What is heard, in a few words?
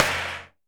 Ethno Techno slap; ILIO - Ethno Techno